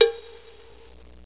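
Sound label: wood block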